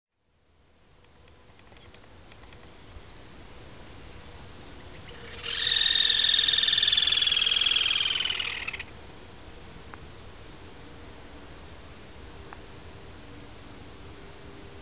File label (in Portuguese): canto da sana parda